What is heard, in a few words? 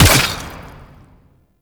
Infested Burst Rifle Shot 2D, weapons